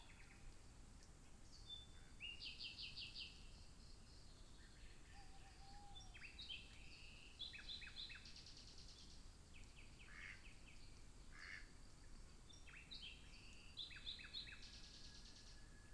Nature European Wetland Pre Dawn Chorus Stereo Binaural